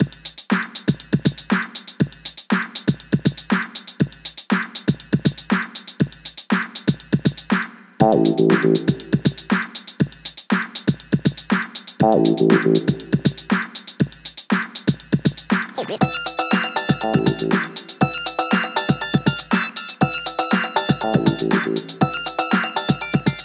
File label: Boom Box Track loop lo